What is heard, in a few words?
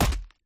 Headbutt; Slash The Hordes